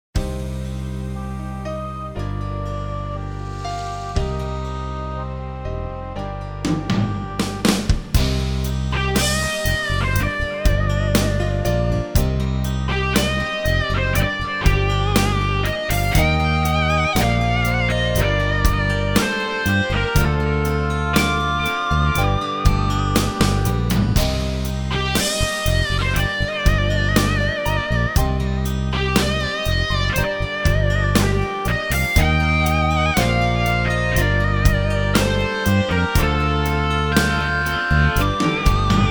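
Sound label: more guitar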